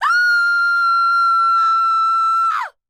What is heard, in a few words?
Scream Female; SFX Producer